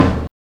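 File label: bass drum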